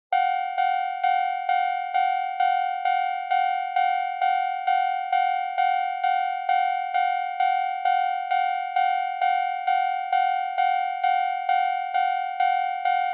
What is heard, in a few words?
Railroad Crossing Signal